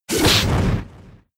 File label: Fire Punch; Pokemon; Gen 5; Blk Wht Blk2 Wht2